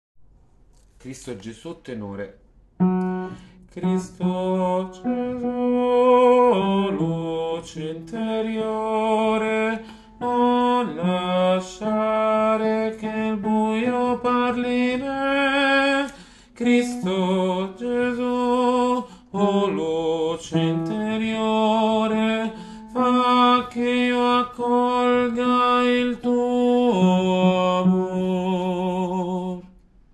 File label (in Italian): Tenori